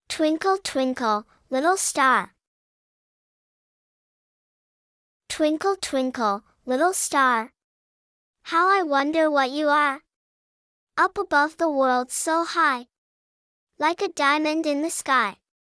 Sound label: twinkle little star; virshi anglijskoyu dlya ditej